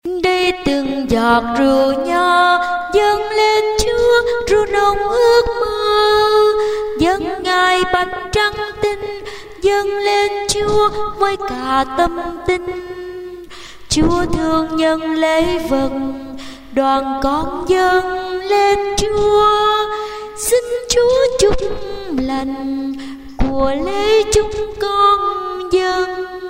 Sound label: tenor basso